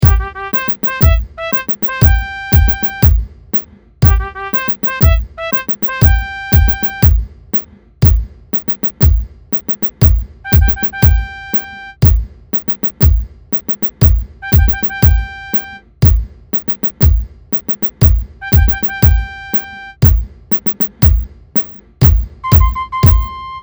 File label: fanfare rehearsal track